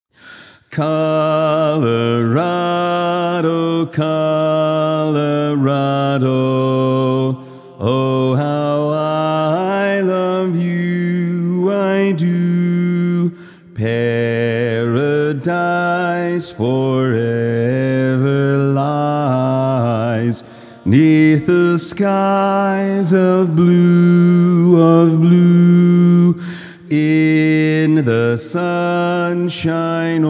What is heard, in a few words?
Bass